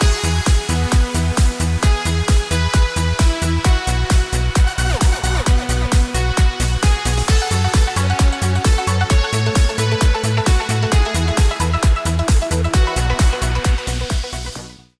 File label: Schlager Dance